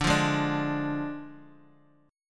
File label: D Diminished Guitar, chord; 9,8,8,7,x,x; 4F,4A,5C,5G,5C,6E; strummed